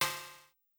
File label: Cym; Sonic-Potions LXR